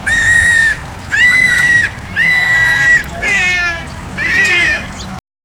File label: crocodiles fighting in th